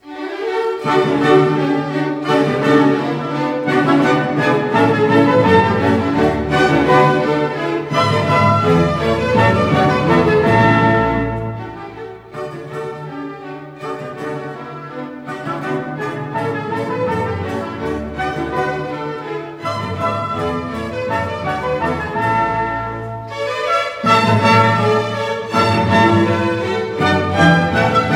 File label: Orchestral Suite No3 BWV1068; Bach; Kempe